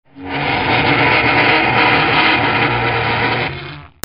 FRICTION

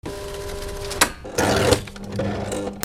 OFFICE

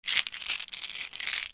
BATHROOM

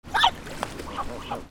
BIRDS

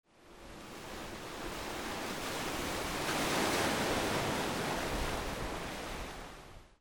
WATER

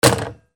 PHONES

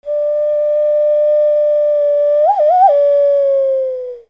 WHISTLES

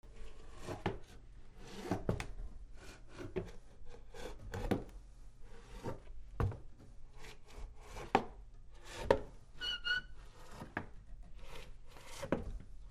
FOOTFALL

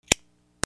FIRE

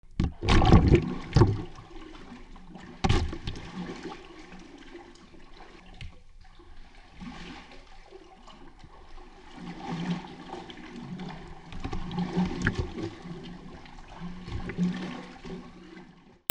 FALLING

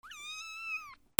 ANIMALS

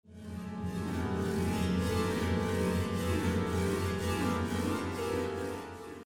MUSICAL

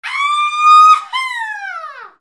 PEOPLE

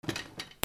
SQUEAKS

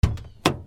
CARS

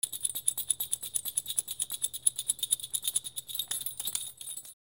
BELLS

